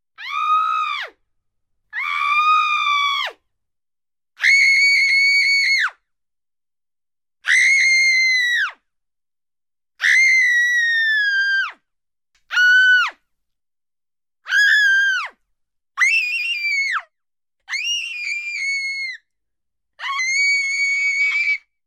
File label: Screams (woman), Scary Sounds; 1001 Sound Effects; Halloween Horror Sounds Effects